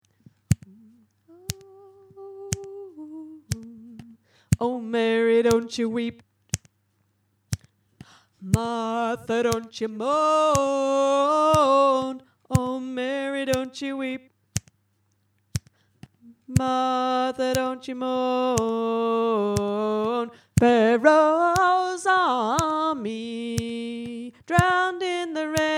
oh mary dont you weep tenor

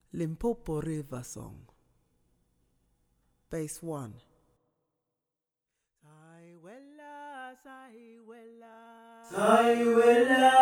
Bass, gospel choir; Limpopo River Song

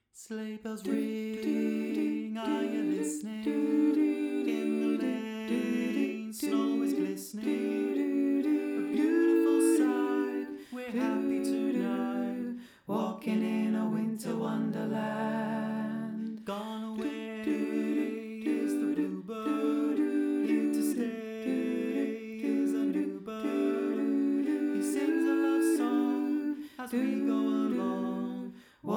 Alto

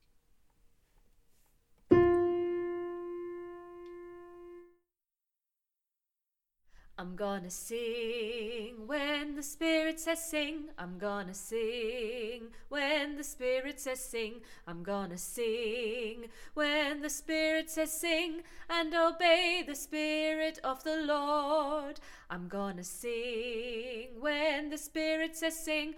Tenor

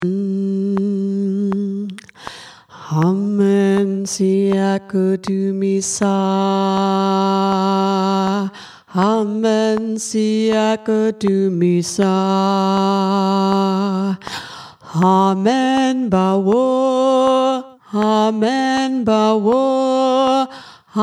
Tenor; Amen Siakudumisa

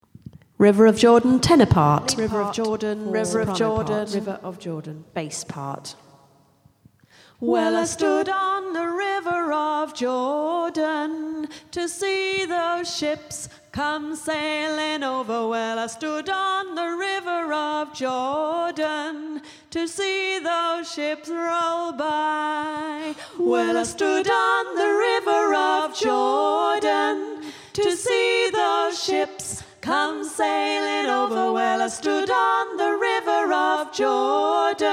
tenor; river of jordan

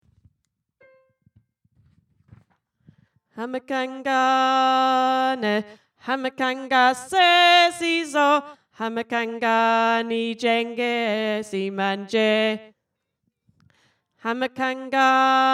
tenor; hambakancane

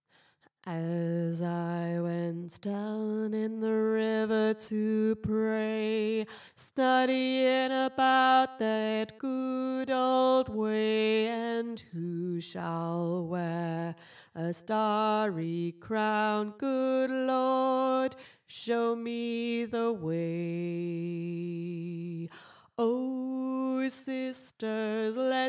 tenor, gospel choir; down in the river to pray

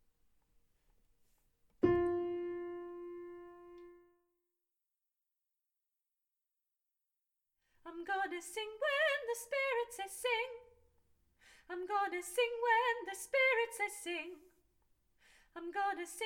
Sop, gospel choir

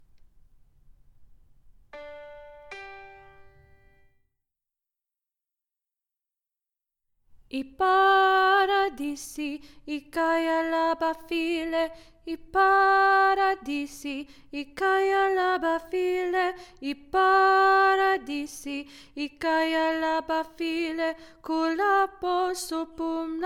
Alto, gospel choir

